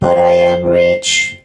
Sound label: hurt